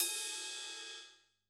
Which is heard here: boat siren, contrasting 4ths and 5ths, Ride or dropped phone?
Ride